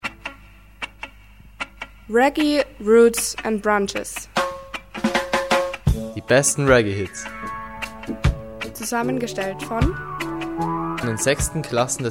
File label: reggae sendung